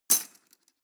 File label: cutlery teaspoon drawer place hard